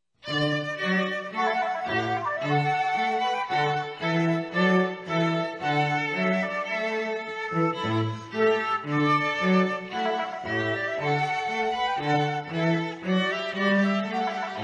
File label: trumpet voluntary